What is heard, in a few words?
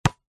sport basket ball hard bounce x1 on asphalt concrete